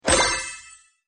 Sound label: get money; 弹球音效; tanqiu